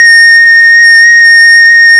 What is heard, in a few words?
whistle A